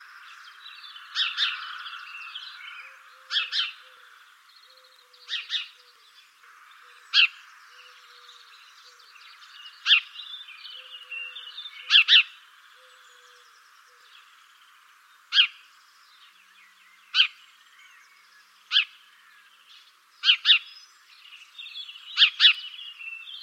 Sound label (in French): moineau domestique, Moineau domestique; chants d'oiseaux; moirans 4 mai 2006; enregistrés par Jacques Prévost de la LPO